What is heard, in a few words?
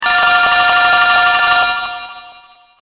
Ringer Classic Phone; Blackberry Bold